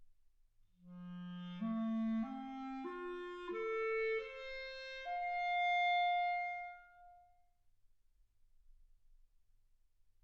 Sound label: clarinet